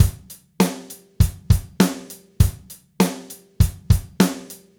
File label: Gretsch groove 90 BPM, 1967 Gretsch Kit drums